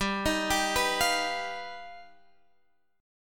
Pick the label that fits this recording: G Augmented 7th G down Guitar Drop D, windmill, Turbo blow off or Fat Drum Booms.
G Augmented 7th G down Guitar Drop D